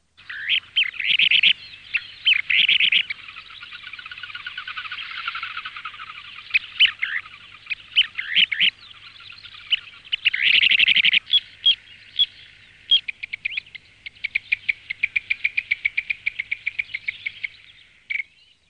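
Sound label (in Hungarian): Széki lile; Madarak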